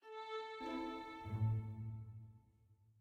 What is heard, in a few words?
mysterious soft end; Quivr; PS4 Windows gamerip 2016